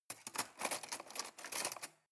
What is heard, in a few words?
cutlery cake fork drawer handle long